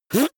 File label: beatbox effect suck in